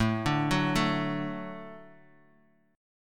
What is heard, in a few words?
A 6th Suspended 4th, guitar; A6sus; arpeggiated